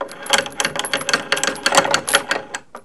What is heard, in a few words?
chain pul; The Elder Scrolls III - Morrowind; Morrowind GOTY GOG